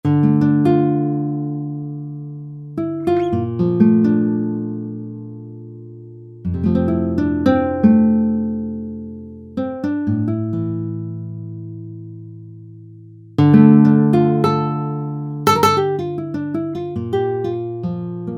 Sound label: GUITARS - Classical Acoustic; Apple GarageBand 2